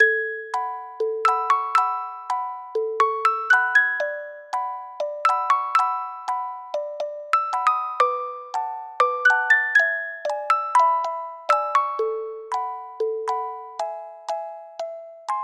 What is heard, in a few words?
Bossa, Music Box; animal crossing new leaf welcome aamiibo soundtrack